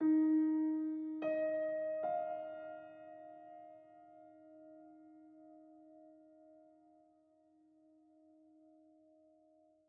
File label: Env Field Night Ground; the legend of zelda tears of the kingdom